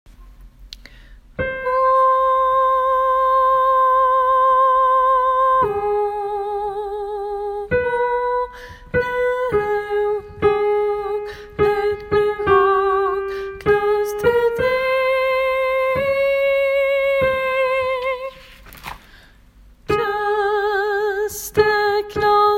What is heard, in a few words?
tenor; just s closer walk; åfjord